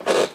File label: floor creak